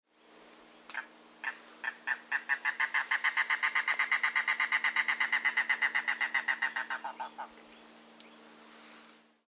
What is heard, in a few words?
Mangrove Cuckoo Song Phone